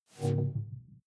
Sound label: sci-fi critical bell clean